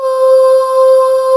VOICES C5, Choir; USB Soundscan vol.28 - Choir Acoustic & Synth; AKAI

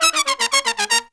Pop & Funk Brass, R; Best Service ProSamples vol.25; AKAI

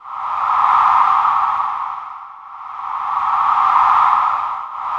ARCTIC WIND, ATMOS HUM; Spectrasonic Distorted Reality 1

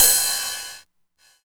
Ride, Roland MV8800; DIRTY 9

